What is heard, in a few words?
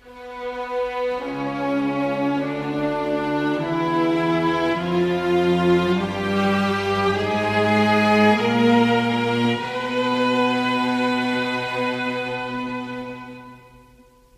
strings steady rise, Sad Loops